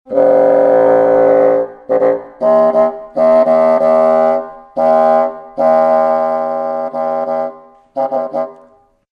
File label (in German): fagott